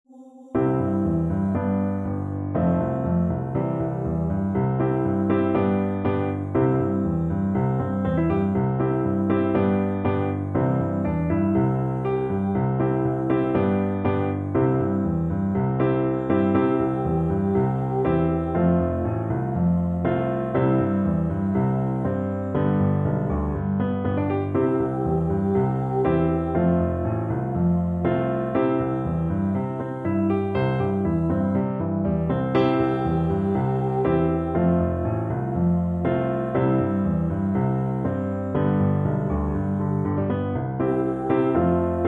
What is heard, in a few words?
piano accompaniment